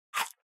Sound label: bite